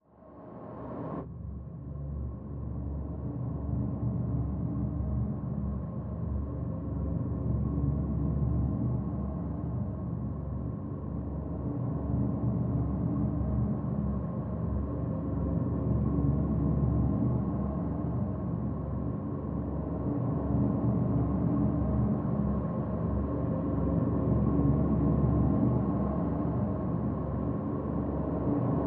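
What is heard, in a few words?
drone simple C; Drn Bleeding Out Simple C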